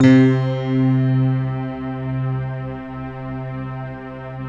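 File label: bright electric piano strings; Optical Media International - Sonic Images Library; Slopiastring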